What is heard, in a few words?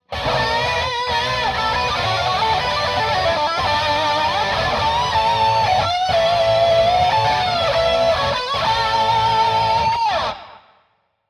Lead Sound